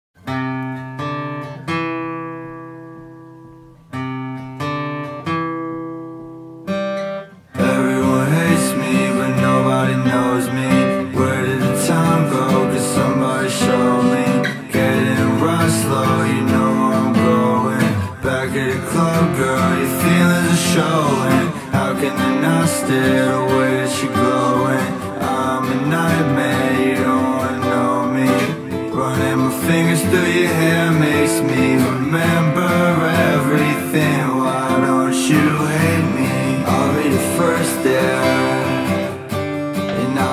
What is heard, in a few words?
Acoustic Version; PeepHub Edit Contest